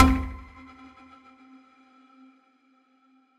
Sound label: on item rare, lootbox